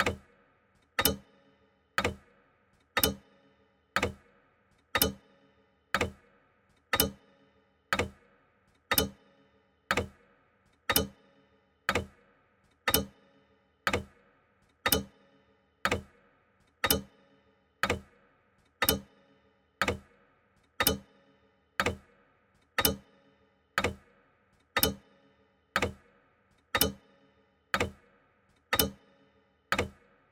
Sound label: tictac loop pur